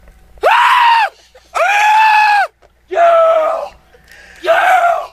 screams

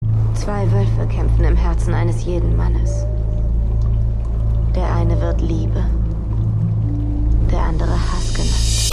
cyber industrial dark electro

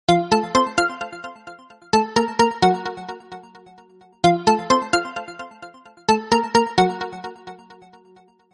death chimes

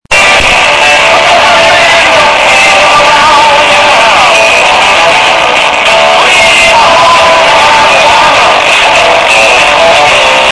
earrape

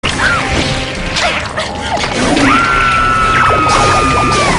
scream; Teen Titans Starfire

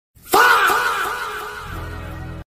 ffaaaaaaaaaaah; Meme Sound Effect

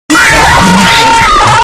full scream sound; five nights at freddys 2; Withered Stitch

